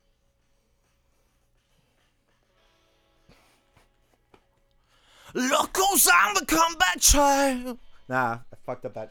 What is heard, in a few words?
Vocal